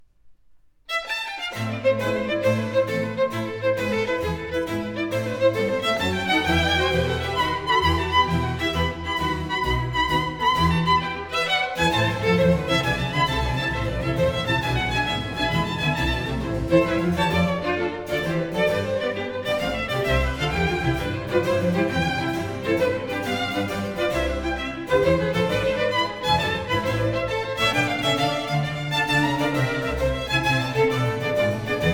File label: Violin Concerto No. 1 in A minor